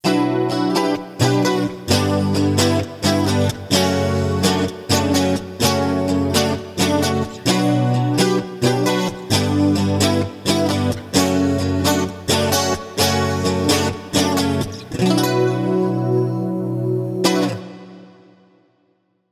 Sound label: guitar; pillbug